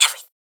Perc, Psytrance; Hats & Percs

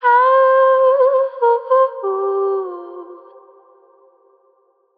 Ad Lib Wet C Min, Vocals; Cymatics - Eternity Sample Pack; Ad Libs

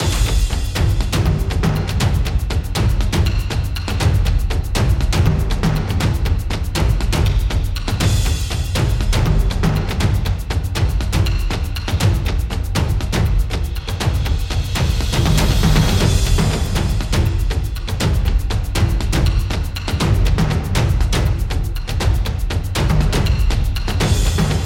Percussion - 120BPM; Ghosthack; SkyRealm; Victory On The Reach; Cm

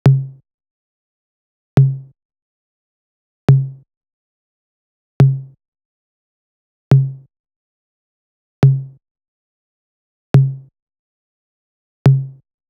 Drum Loop 140 BPM Tom; Footwork; Experimental Drum Loops; Cymatics; Mystery Pack Vol 4

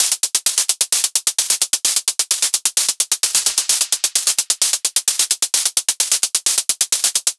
Hat Loop 130, Techno; Ghosthack; Free Techno Sounds 2024; Hats

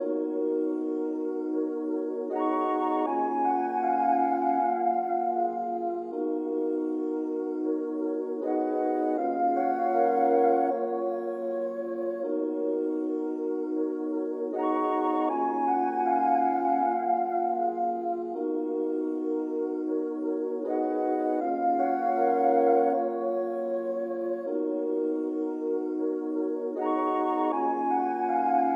157 BPM D# Min Chords; RnB; Melody; Loop Stems; Cymatics; 2022 Melody Collection; Free Your Mind

